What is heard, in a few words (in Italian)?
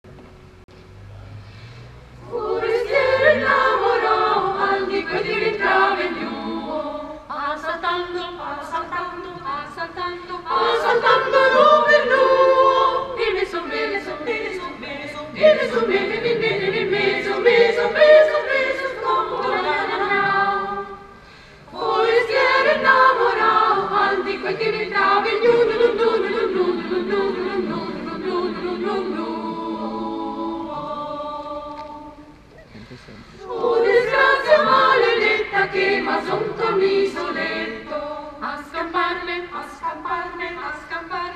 coro; J.S.Bach; Forestier innamorao